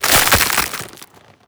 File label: zombie move loud, foley; creatures; Half Life Model Pack